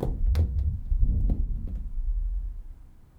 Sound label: Tapping; Micro Pack